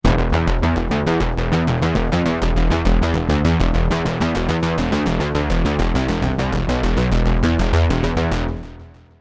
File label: Skinny Unison